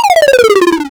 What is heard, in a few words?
video game landing sound